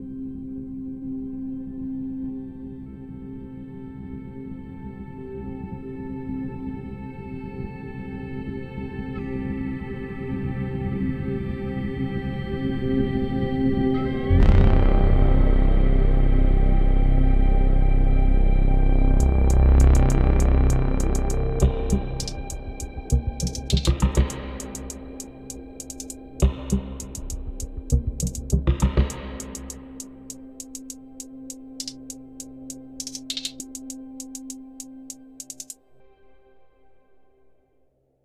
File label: Loop 23 Bar 100 Bpm; Proto Pulse Test 4a